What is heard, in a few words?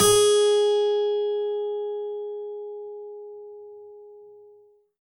g sharp, guitar